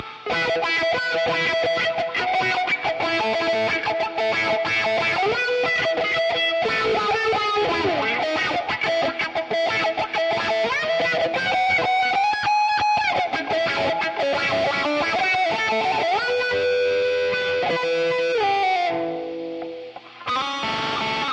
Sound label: reggae guitar farting around; GUITARS